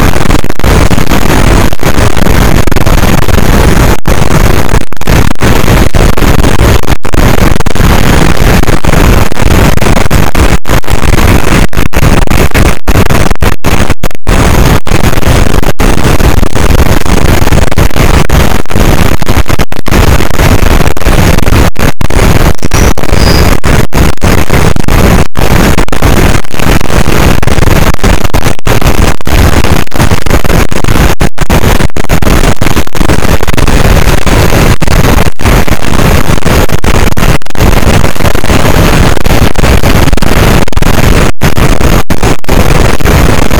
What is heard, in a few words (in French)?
cachalot negatif, boat, Physeter Macrocephalus